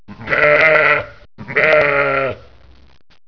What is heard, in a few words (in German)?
SCHAF